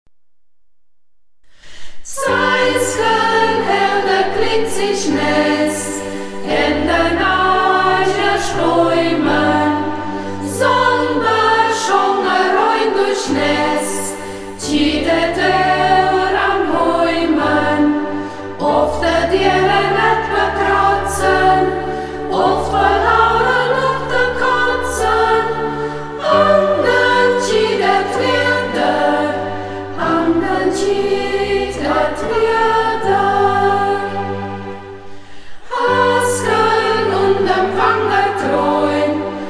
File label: chor, mundart; Burgberger Chor; Ortsmundart: Burgberg; hegt wird gesangen